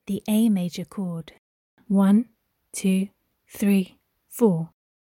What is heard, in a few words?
a maj chord